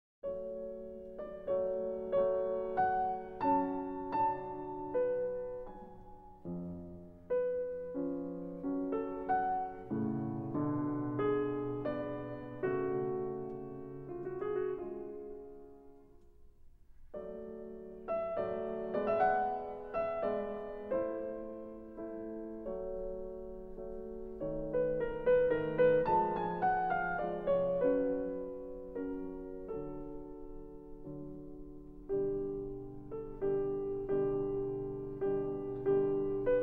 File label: Piano Concerto in A Major, Adagio